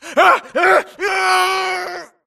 grunt Struggle; Titanfall 2

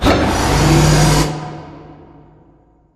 Assembly Plate Lift Up Metal Lift; Boomtown; Titanfall 2

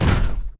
Footstep Jog Close; Titanfall 2

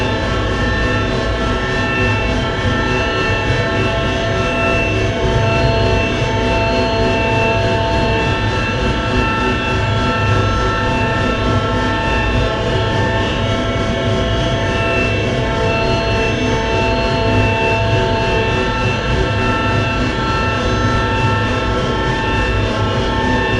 Robot Arm Track Med Close; Boomtown; Titanfall 2

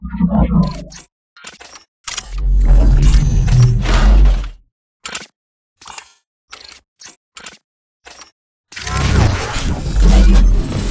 Casual Idle; Titanfall 2

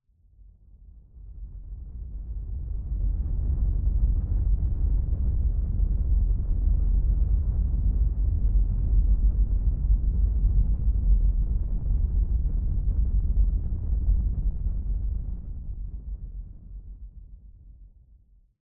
Giant Floor Panel Arrive LFE; Boomtown; Sideways Town; Titanfall 2

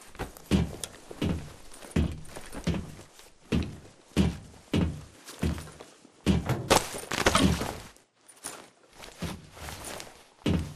victory; Commander; barker; Titanfall 2

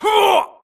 grunt death quick; Titanfall 2

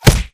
bullet light ballistic flesh; Titanfall 2

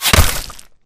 bullet generic head kill shot human; Titanfall 2

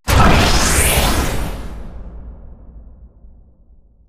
generator shield recharge end; coop; Titanfall 2

